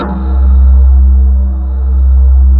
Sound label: MALLET C2; MALLETTE